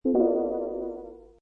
Space Machine, Scifi, Computer, machine or instrument moving; Computer, machine or instrument moving, opening, closing or processing data